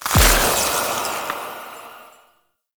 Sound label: Conservation Cleanse Complete; Warframe; Duviri